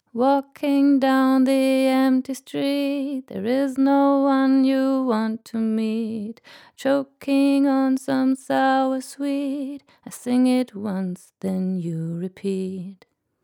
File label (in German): Vocal ohne EQ; Gyraf Audio G14-S